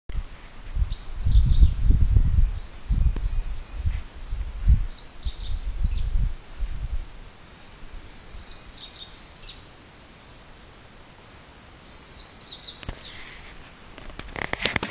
kotoritati; わが家の周辺では小鳥たちの鳴き声が賑やかになってきました。; 春を告げる素敵な鳴き声

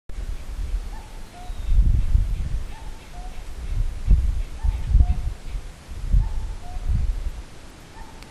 kakkou

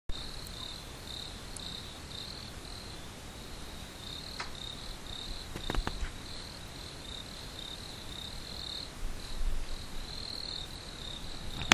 mushinokoe

